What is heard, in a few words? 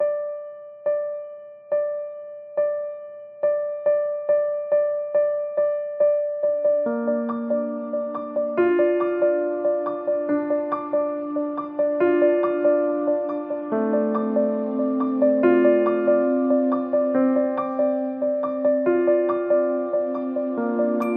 ding